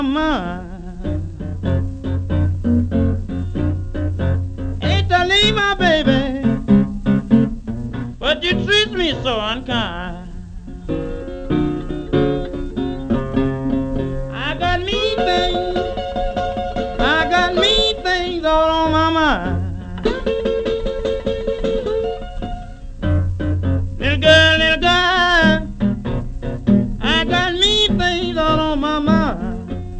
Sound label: blues